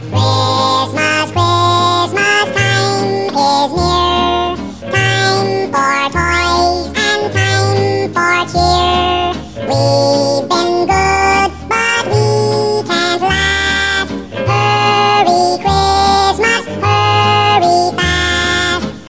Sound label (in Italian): Natale Divertente, suonerie